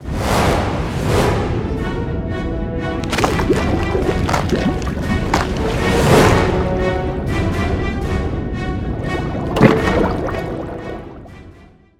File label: big win; Hungry Shark; Wazdan